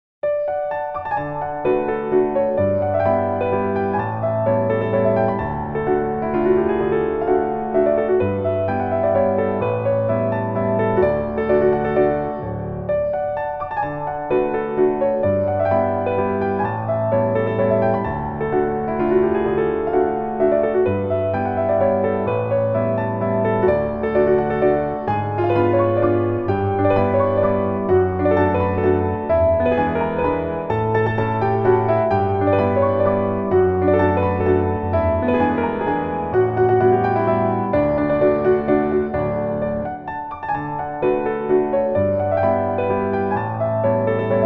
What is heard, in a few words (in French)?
Valse du Temps 1er mouvement; Soleil Chantant